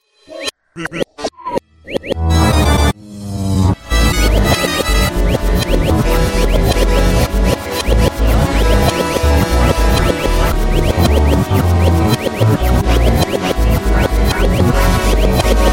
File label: dogbass, reverse